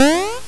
adult jump; DS; MARIO LUIGI PARTNERS IN TIME

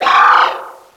shreeking; DS; ML BOWSERS INSIDE; Dark Star

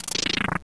Brick Shatter; SNES; SMALLSTARS

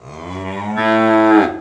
moo; N64; MARIOKART64

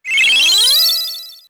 battle vigor up; SNES; SMRPG

